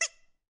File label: quack